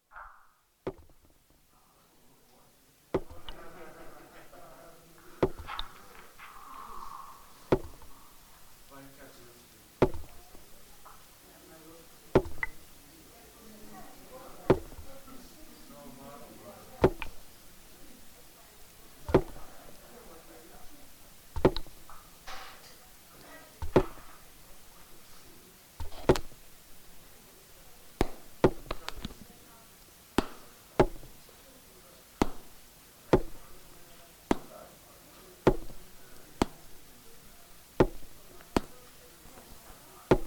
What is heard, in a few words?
opening water drips